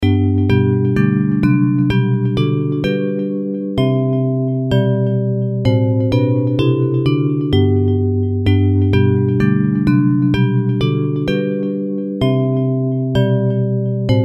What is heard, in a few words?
Bells